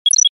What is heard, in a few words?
Digital audio signal; radio signals